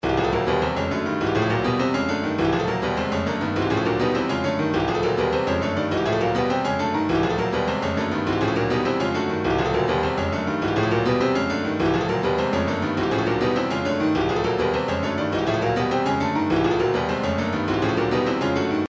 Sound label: retro funk 102, midi; bay